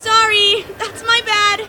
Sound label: lose; pranger normal